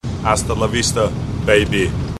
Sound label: baby